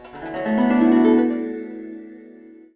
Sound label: Unlock